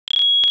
Sci Fi Beep

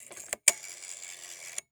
Telephone Rotary Dial; Communications

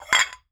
Saucers Sliding, Kitchen

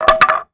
Wood 2x4; Tools

